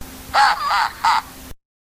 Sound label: Wiimote Sound; Wario